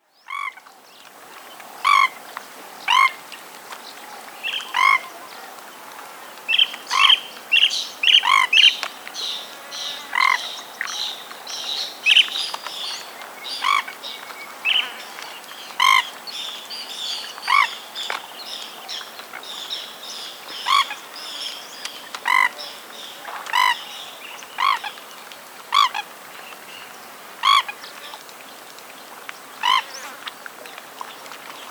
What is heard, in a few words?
Jacana Sound